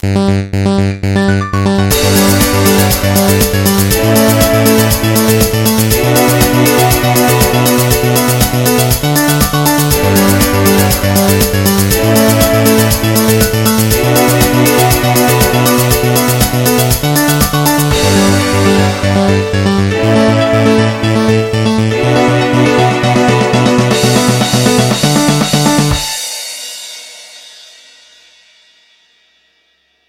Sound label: NOKIA NOCTURNAL MELLOW